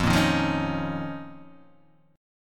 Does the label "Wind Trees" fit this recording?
No